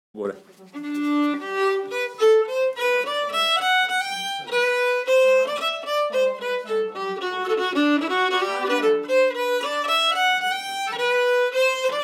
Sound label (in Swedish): G-dur; Edwards glada